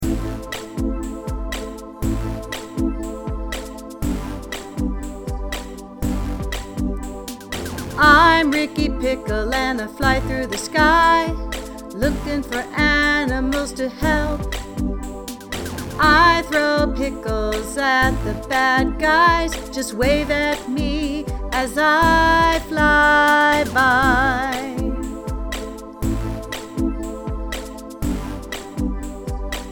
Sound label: vocals